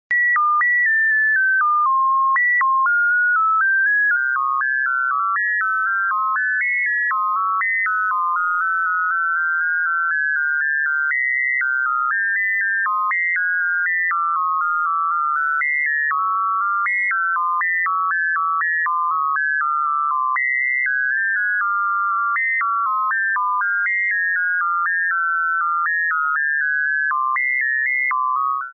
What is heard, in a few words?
Twinkle